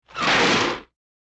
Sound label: screechy door slam